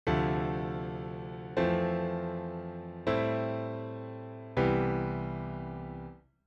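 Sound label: chain of chords D F A C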